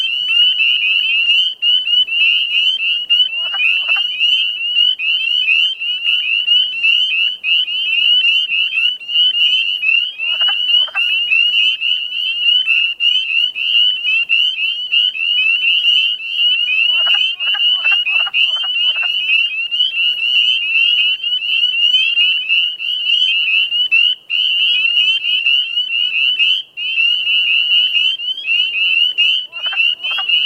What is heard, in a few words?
Peepers Wood Frog